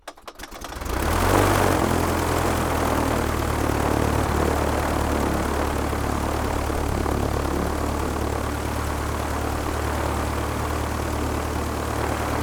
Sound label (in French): DEMARRAGE ET RALENTI